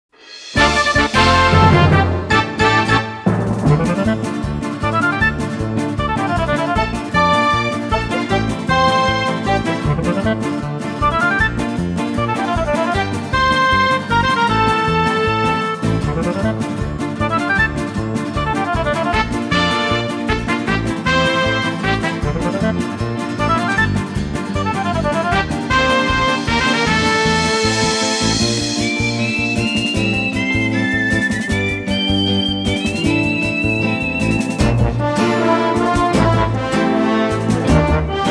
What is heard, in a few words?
Celestial Valley (Valley Course); Kirby's Air Ride; Business Trip Sound Test